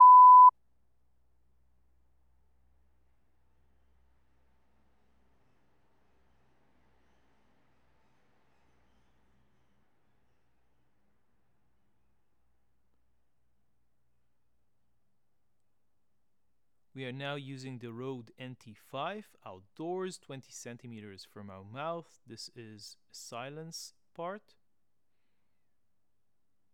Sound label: outdoors rode nt5